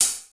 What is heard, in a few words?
airy sizzle closed hi hat sound d sharp key; hat samples